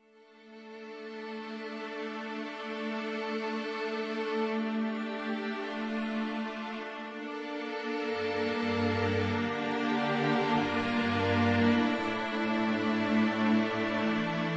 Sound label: instrumental music